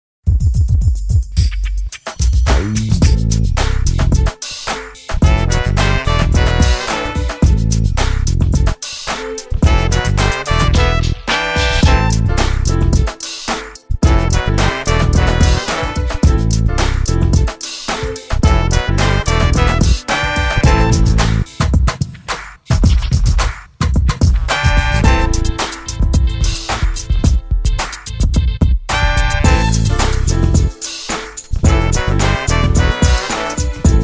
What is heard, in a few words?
Hip Hop, horns